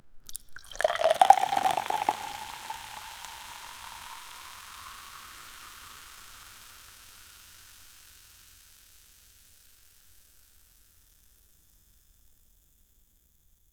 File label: pour cold prosecco in a, bar